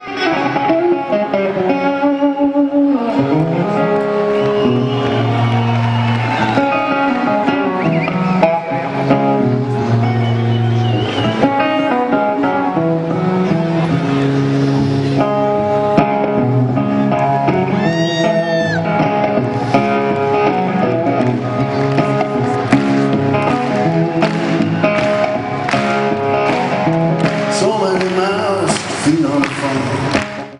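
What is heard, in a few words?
Gibson, guitars; Milano Italy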